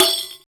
Rek Khana Open; Roland BK-5; Percussion

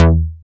BS STAB; AMG - Now CD-ROM (Roland); Pete Gleadall; Samplography

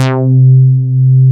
MOOG, Source Bass

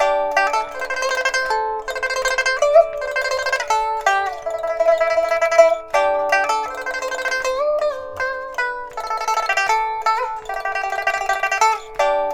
PIPA, 90; INIS - Opium

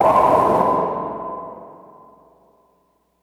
Dub Snare; Sonic Foundry (Sony Creative Software); Electronic Point Blank; Drums & Percussion

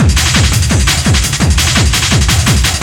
LOOP, Eurotech; Sounds Good

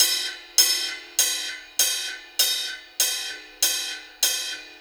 Ride 99 BPM; AMG; Keith LeBlanc Phat Butt Jams

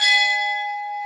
CHIME; Roland - LA Composer vol. 1; STANDARD PRC

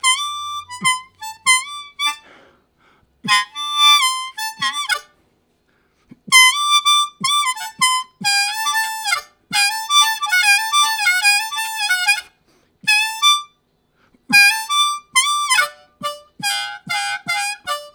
High Squeaker, Harmonica; Zero G

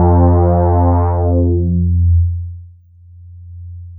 bass, synths

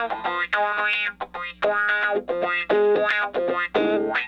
Raw Funk Guitar

